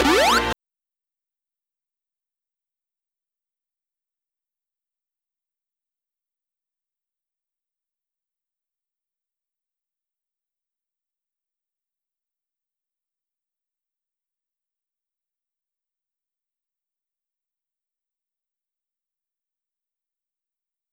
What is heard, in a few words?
heal; pokemon x y